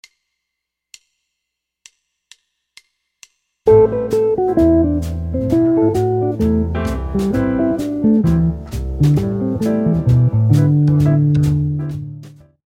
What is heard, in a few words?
quartal chords, guitar